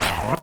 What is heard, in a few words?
menu Swipe; immibis